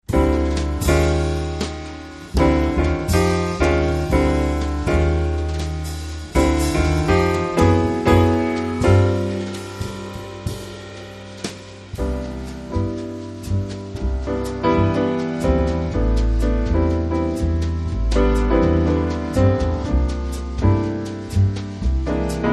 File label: samba jazz trio